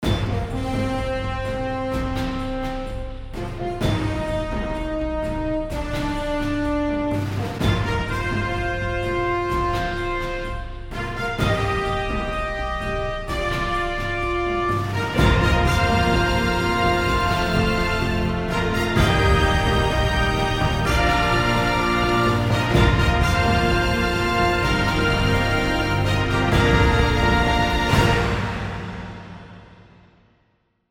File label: stock music